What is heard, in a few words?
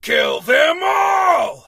alert; st allies; darkwing